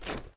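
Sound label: shuffle, footsteps hard surface jump up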